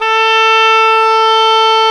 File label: oboe, Oboe Brth; Roland LCDP04 Orchestral Winds; Oboe Brth 1